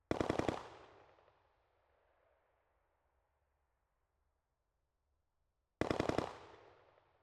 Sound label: AK-74 rear long distance burst 05 rounds; FLYSOUND